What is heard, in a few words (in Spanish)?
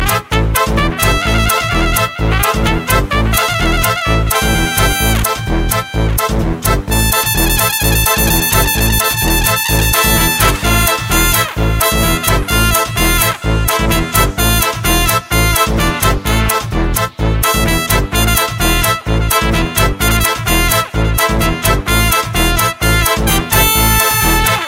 La Trompeta